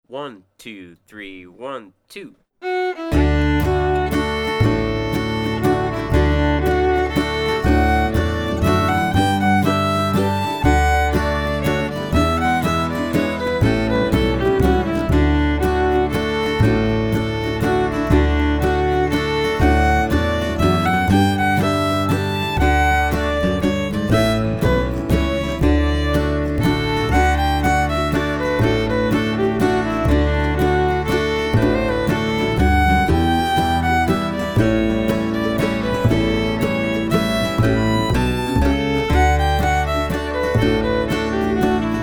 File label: Country Waltz